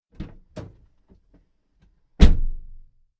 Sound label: car door closing